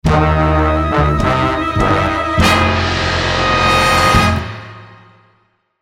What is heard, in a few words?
suspense